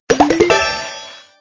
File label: Box blow